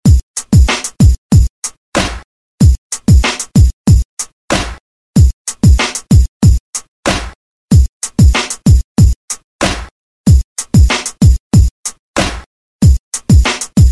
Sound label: Hype Redrum